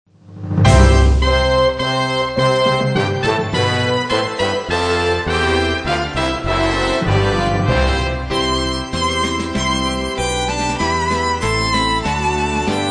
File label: Instrumental Hymns